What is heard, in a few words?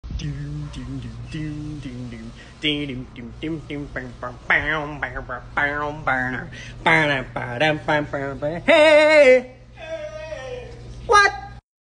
airplane preflight